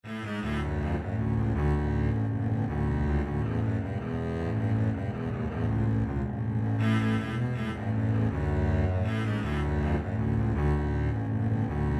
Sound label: 2 double bass, double bass duet